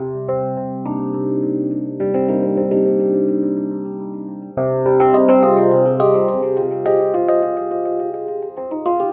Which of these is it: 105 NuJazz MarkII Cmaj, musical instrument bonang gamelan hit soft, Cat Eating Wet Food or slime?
105 NuJazz MarkII Cmaj